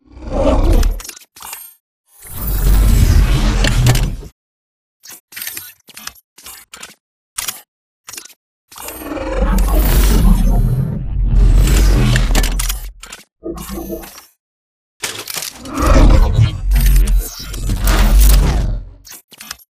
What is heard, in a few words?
Casual Idle; Titanfall 2